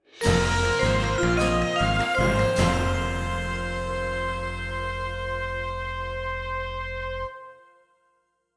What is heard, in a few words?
Vibes, trumpet no strings; Short